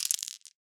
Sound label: Marbles Rattle